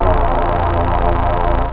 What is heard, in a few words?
organ, rave